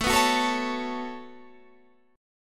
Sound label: B 7th Suspended 2nd, chord